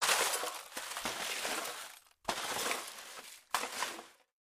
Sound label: Junk Swept Up By Broom